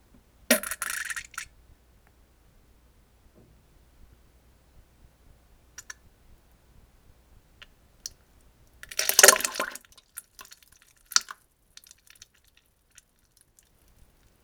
下痢からなんべんになりかけ：びっ りゅるるる ち ち ち ちゃ りり りゅりりゅあ（ぼばちゃちゃちゃちゃ） ちゃちゃ （ぴちゃ） ちゃ

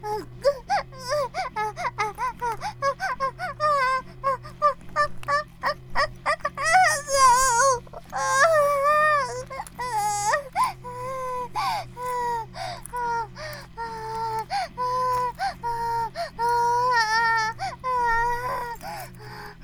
バイブ放置, ASMR

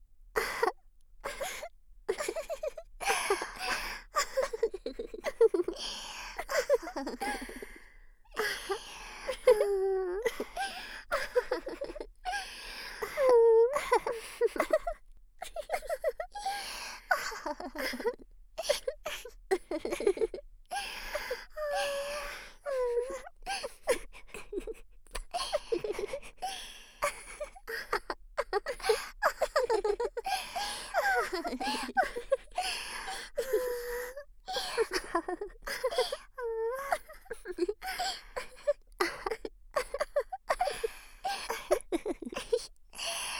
笑い

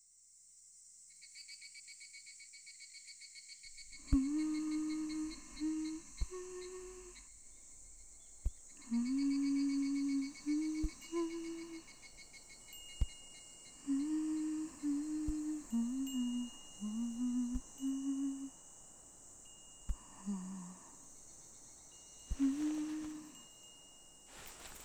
子守歌からのご挨拶

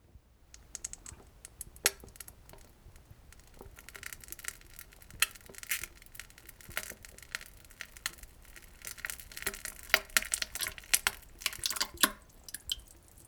ほぼ治りなんべん：ちちち（ぷ）むちち むむむちゅい むりむりむちちむぅ むちち むむちち（ぴちゃちゃ ちゃ ぴちゃ）

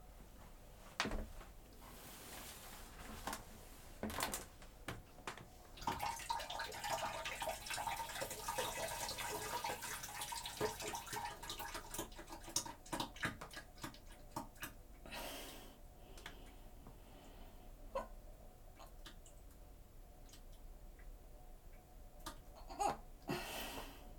放屁放尿脱糞音声集(きばっている音も含めたロングバージョン)

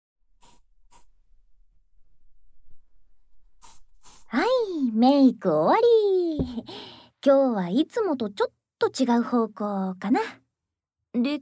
囁き・咀嚼音・耳もとスナックASMR; 楽屋こそこそ裏話

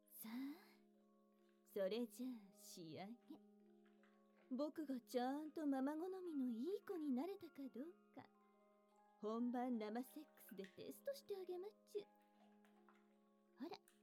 ガラガラ鳴らしながらのラブラブ求愛セックステスト; ハイパーあまえんぼうタイム; 再来園ver

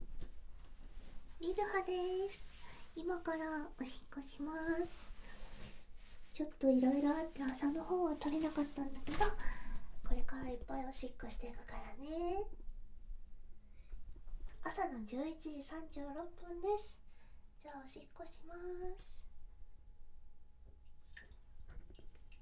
おしっこです！

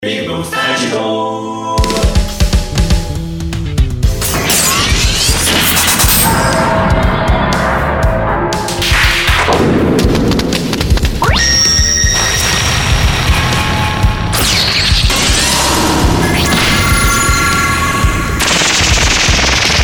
戦闘武器 サンダー; ぴぐみょん効果音素材集; ぴぐみょんスタジオ

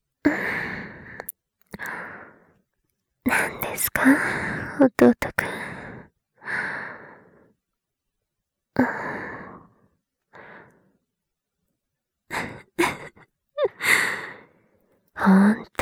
耳舐め添い寝 左

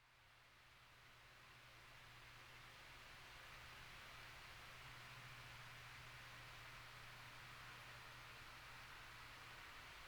砂蒸し風呂でリラックス（砂蒸し風呂＆砂かけの音。タオルで汗拭き）; りいこ

